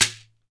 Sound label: SHEKERE, Synclavier Percussion; World & Orchestral; ILIO